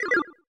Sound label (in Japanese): ryunryun